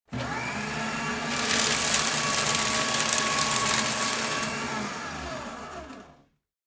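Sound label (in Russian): шум уничтожителя бумаги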